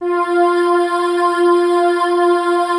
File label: breathy F3, female vocals; MISC and SPOKEN WORD